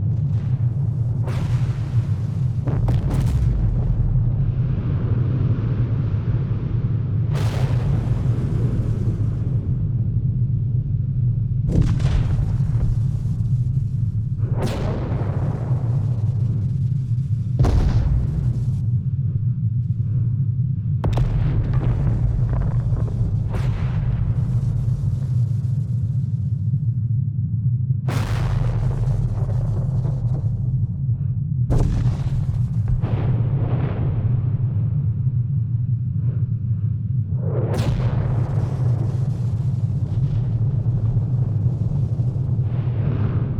amb nexus storm; Stellaris; cosmic storms

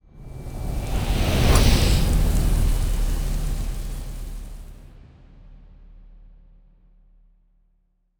ship colony ship move; Stellaris; toxoids; ships

